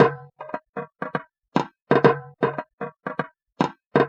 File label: Acoustic Percussion